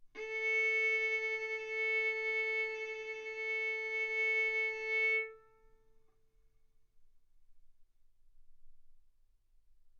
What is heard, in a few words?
Violin arco A4 B4